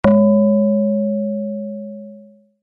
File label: tone